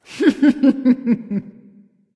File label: hehheh; daji